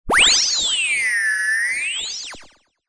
cartoon anime laser shoot modulate slight static clicks